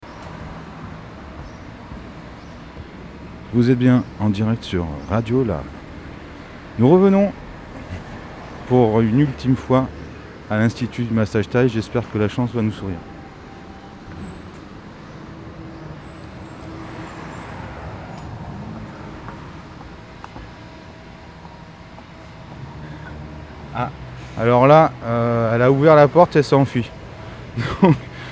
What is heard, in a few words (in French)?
Massage Thai